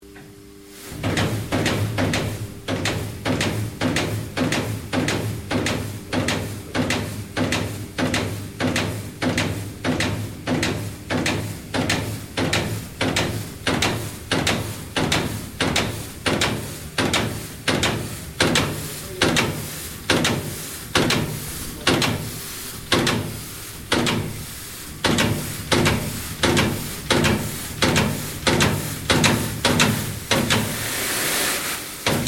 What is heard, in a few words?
PUMP DISTILLARY OPERATING LOOP, Sounds onboard HMAS Castlemaine; Boats (Sound Effects:Vehicles)